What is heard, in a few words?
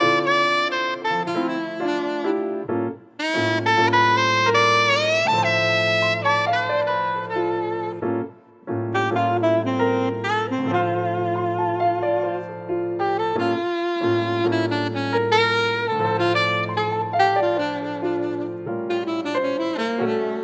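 Jazz trio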